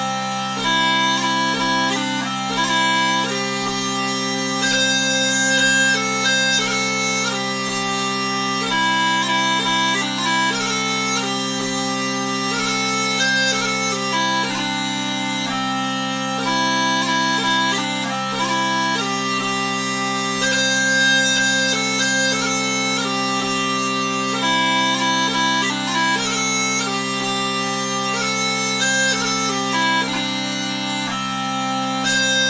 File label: Waltz country dance